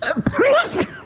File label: autotuned sneeze